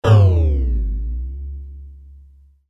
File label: Power Down